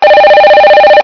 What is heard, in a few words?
Loud Ring